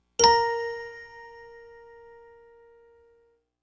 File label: Pandora bell tone